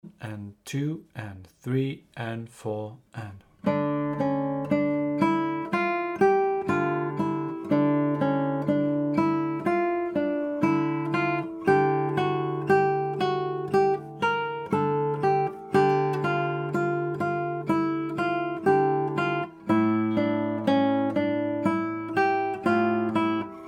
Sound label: 60bpm, guitar